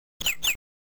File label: tweet tweet, Amiga